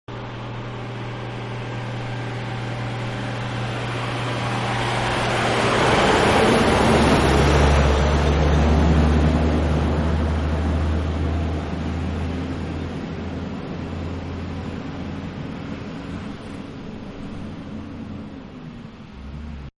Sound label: Dump Truck Pass By; Trucks; Vehicles; Truck Dump-Pass By; Manhattan Production Music